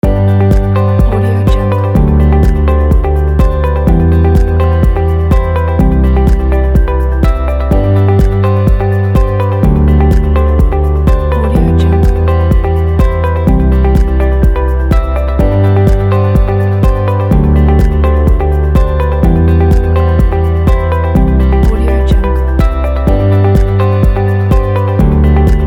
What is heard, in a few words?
corporate presentation loop